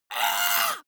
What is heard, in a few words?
monster hit high; schreie